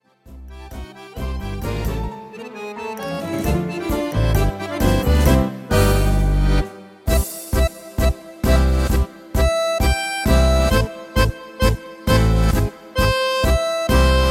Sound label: MOONLIGHT TANGO